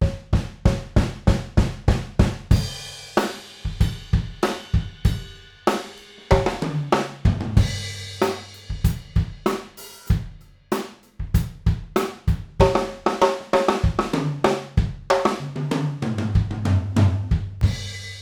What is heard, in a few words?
Room Stereo Fig 8 Null, drum; drum shootout